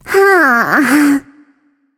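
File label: nonverbal content; smite; voicepack; izanami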